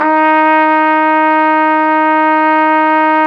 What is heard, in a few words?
Flugelhorn; Roland L-CDX-03 Disk 2